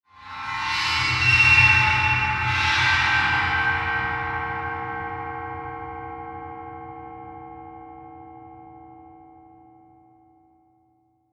SWELL SCRAPES, Swell Scrapes Screech Metallic Reverb